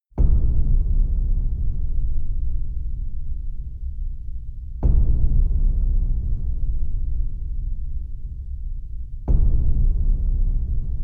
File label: heartbeat drum